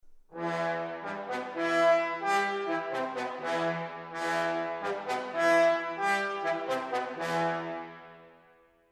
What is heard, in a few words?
trombone